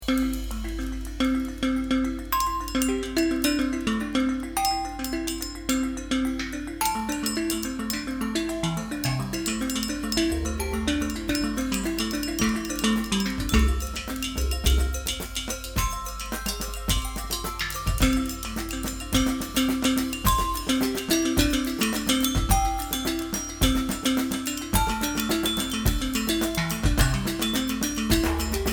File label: bells